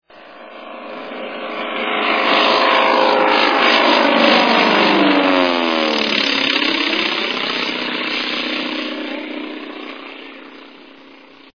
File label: Bi Plane